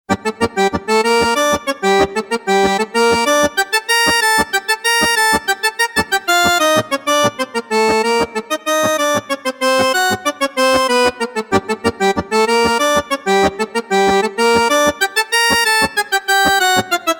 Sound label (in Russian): preludiya bayan sintezator